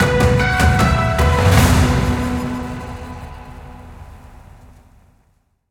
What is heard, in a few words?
Stinger Win; Turbo Shot